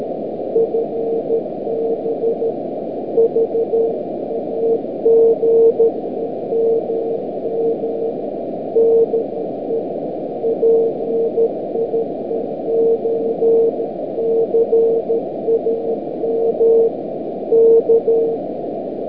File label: CW; PI9CAM; Project Moonbounce